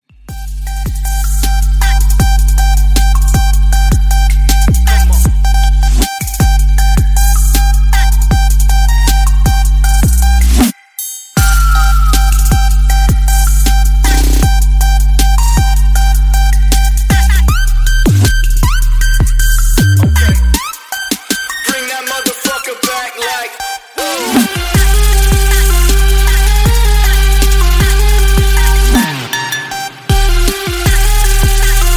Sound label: alarm